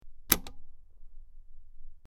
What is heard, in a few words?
Button Large Button P, switch clicks